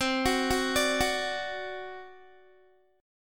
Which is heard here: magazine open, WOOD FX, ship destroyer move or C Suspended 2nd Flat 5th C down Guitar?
C Suspended 2nd Flat 5th C down Guitar